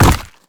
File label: step heavy, zombie blind